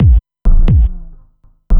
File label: Kick Loop